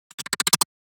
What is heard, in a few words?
Lurker Emote; Evolve; Gorgon